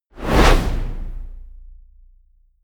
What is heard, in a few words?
whoosh